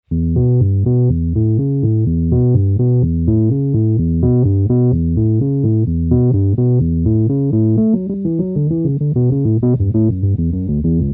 bass, electric piano

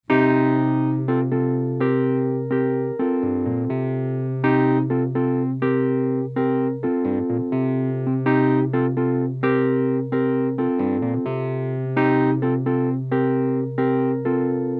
Clean Amp, electric piano

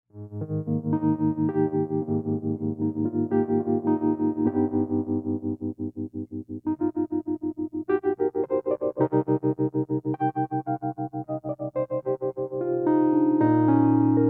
Heavy Vibrato, electric piano

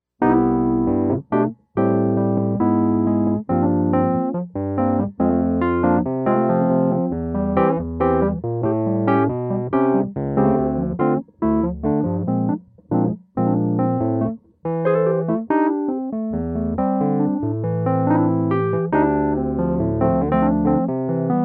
Wurli groove

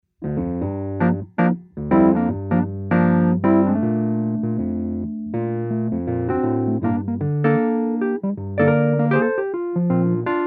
groove speaker, electric piano

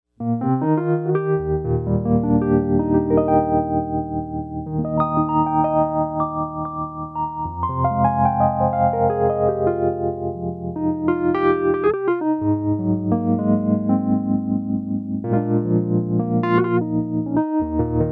Cascading chords

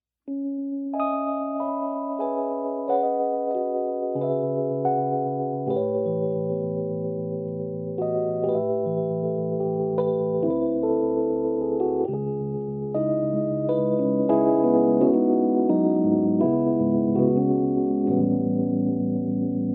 Rhodes Funk Improv

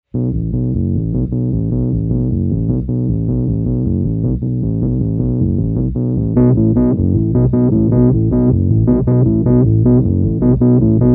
bass, electric piano

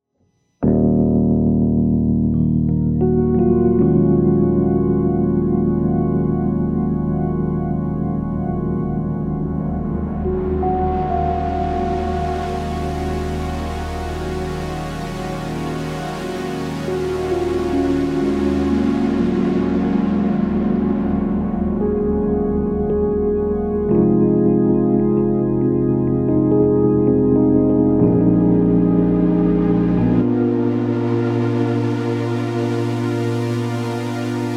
MIDI MKII Deep Space blend, electric piano

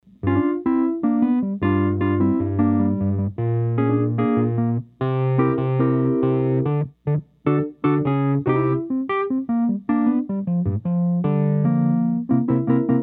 Wurli 106T

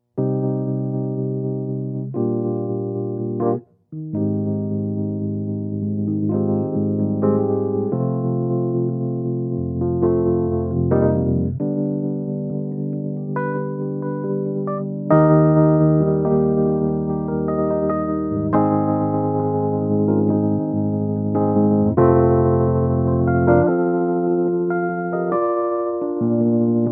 Lunar Satellite Stereo Tremolo

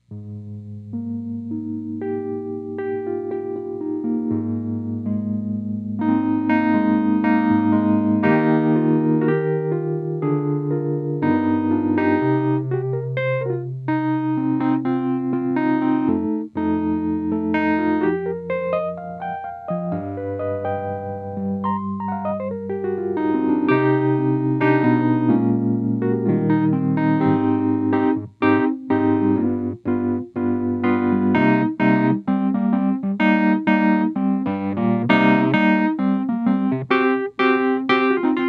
Light Vibrato Twin, electric piano

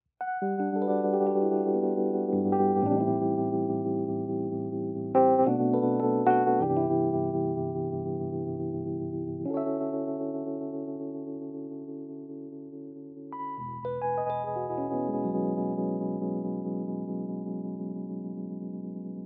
Satellite lush vibe, electric piano

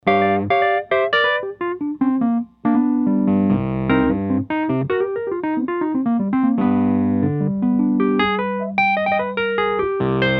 Wurli thru twin

